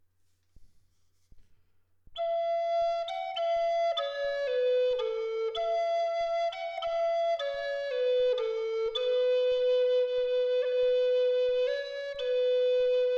Astflöte Ahorn G 432 Hz; Crysokoll; Feder

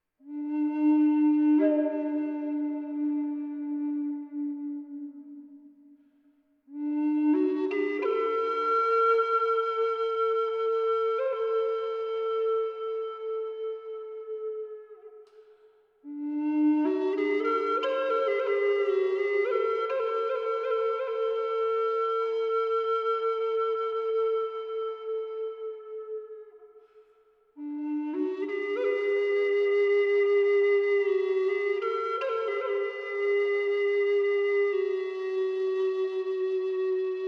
Ahorn gemasert Dis 432Hz Schnecke

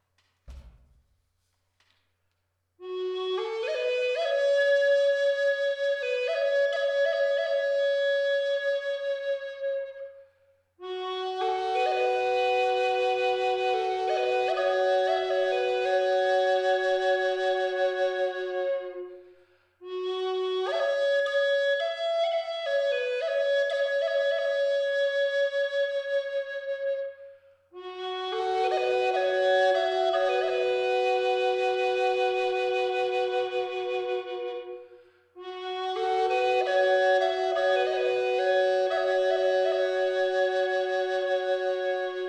Drone Schwarznuss G 432 Hz

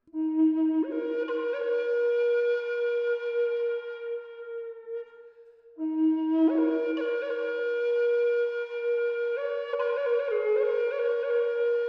Haselnuß E 432 Hz Ast

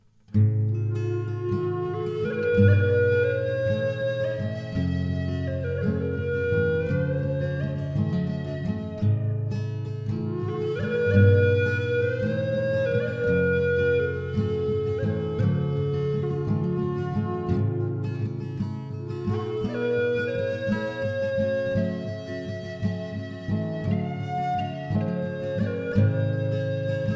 Flöte Eastern Red Cedar Fis 432 Hz

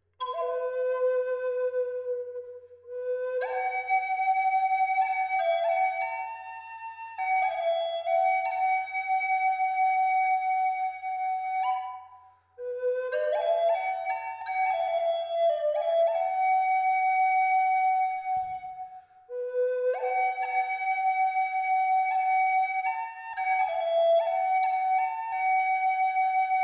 Walnuß C hoch 432 Hz

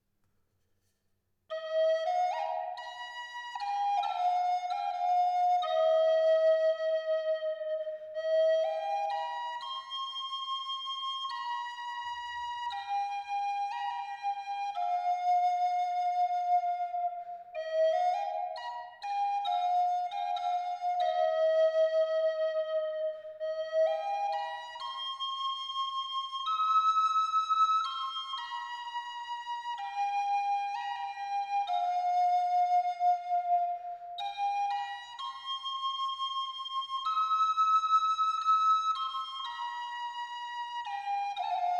Haselbaum Cis hoch 432Hz

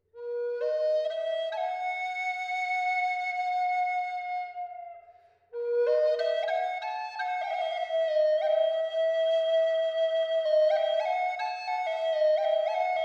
Eibe B hoch 432 Hz

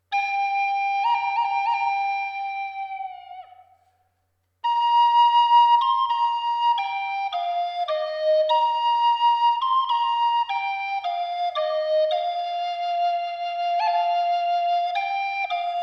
Walnuss Solfeggio 528 Hz

